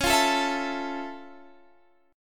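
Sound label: F Augmented Major 7th D flat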